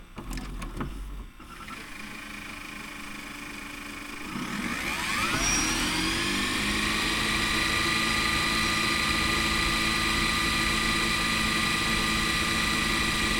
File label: VCR